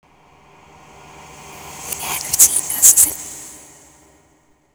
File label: reversed; slender